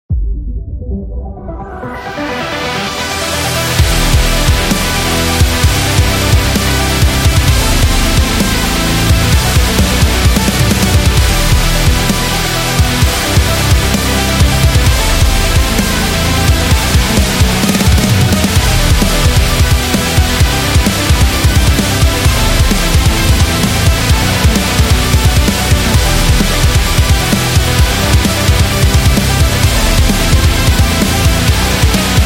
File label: This Metal